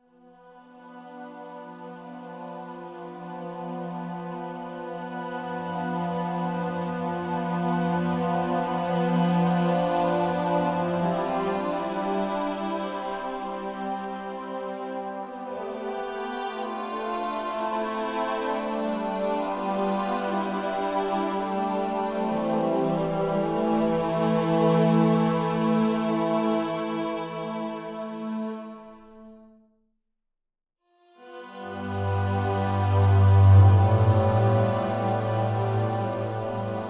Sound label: Solo Strings